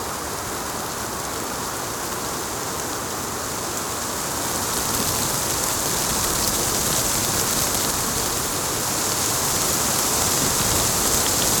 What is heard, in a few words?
Wind plants leaves rustle; Level1 Ice Cliffs